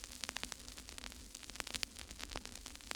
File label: Vinyl Crackles